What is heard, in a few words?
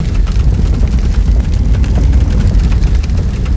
idle loop; movement; lina